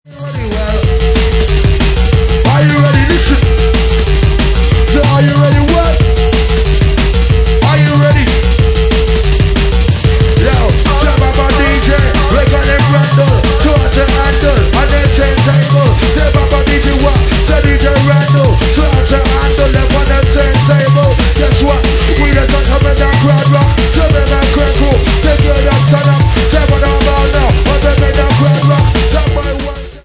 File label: DNB